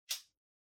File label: Lighter (Far-Flick)